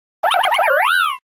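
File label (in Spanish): "Grito de Buneary", Pokémon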